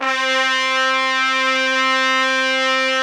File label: C4 TRUMPET; Northstar - New Gold VOL-1; ST.TRMPTS